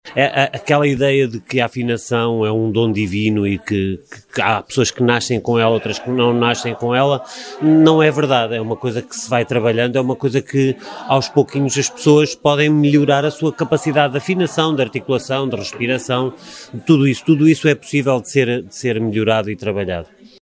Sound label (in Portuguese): CORO